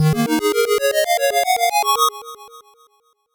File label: 8bit jingle fast